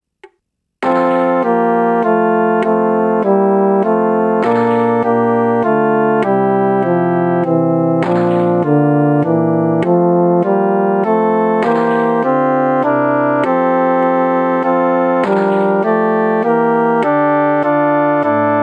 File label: midi